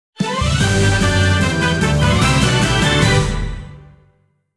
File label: Jingle Get Color; Pokemon Masters EX